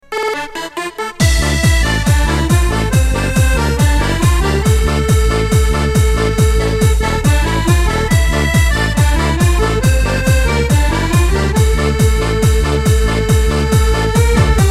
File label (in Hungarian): tango harmonika